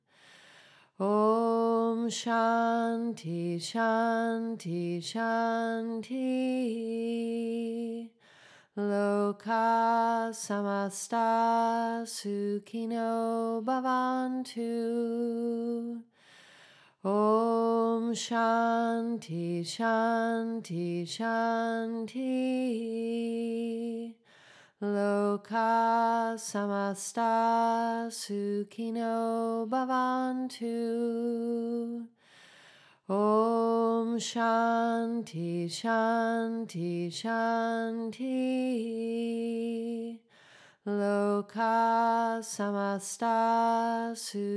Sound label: Yoga OM Shanti dedication chant